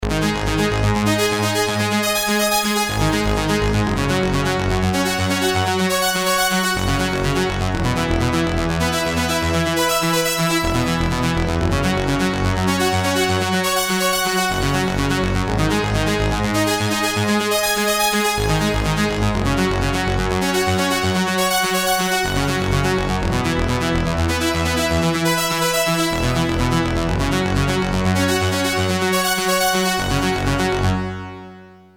arp, yamaha dx 5; another arpeggio

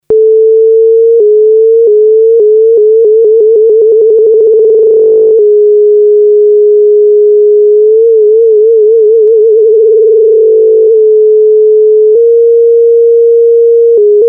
LFO, yamaha tx81z

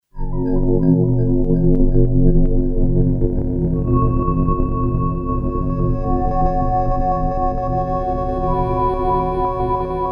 pad hollow, yamaha sy99; synth

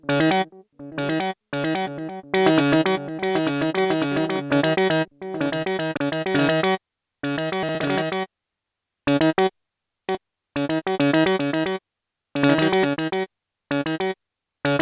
fx delay, minimax; Creamware minimax

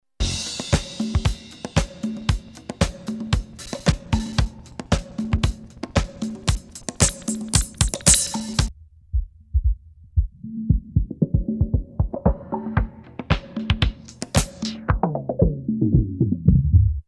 filtering, novation bass station rack

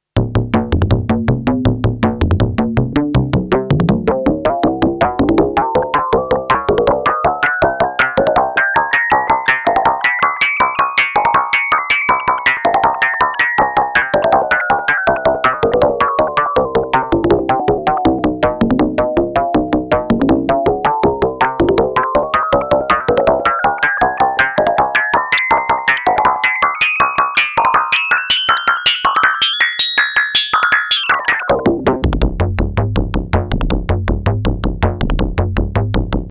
perc tablas, yamaha tx81z

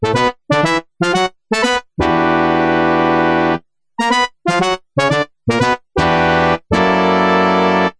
brass, yamaha tx81z

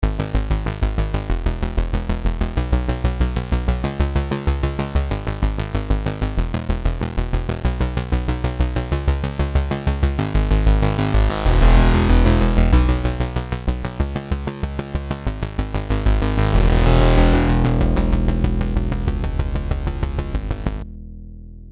bassline; Creamware minimax

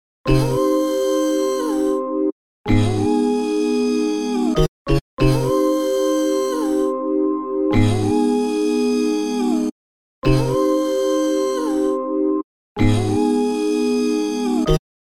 synth voice; emu mo phatt

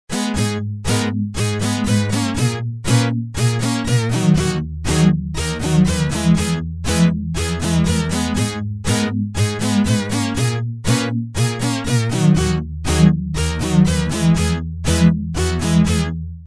synth; Emu XL1 Xtreme Lead

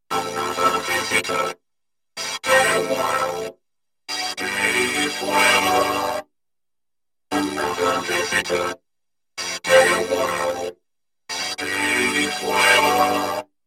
vocoder, synth access virus

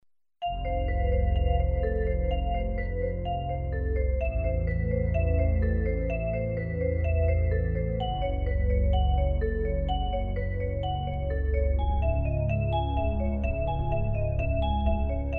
Midi Gm by Korg o3rw; Dune- Cryo (1992)

